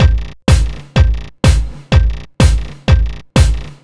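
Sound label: Bass drum snare, 125bpm, Nu Dance Electro; Zero-G; Soundclash; Combi Loops; Kaos Theory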